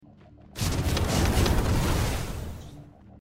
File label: shot; risk of rain 2